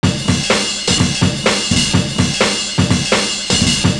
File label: BEAT, loop; Univers Sons - Kitch 70s